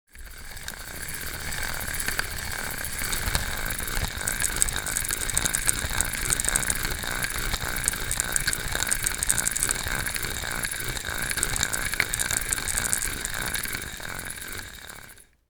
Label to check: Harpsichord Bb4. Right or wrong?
Wrong